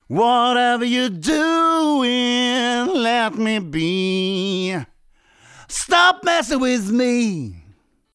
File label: Singing Voice